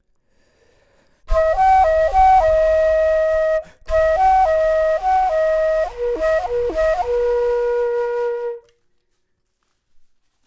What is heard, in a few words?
flute low; logic